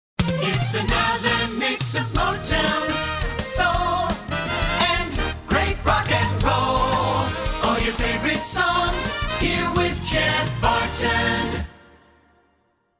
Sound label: Jinglebells